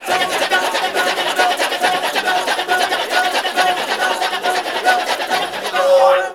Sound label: CHATTER; ETHNICSTUFF